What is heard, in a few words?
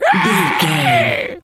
pig scream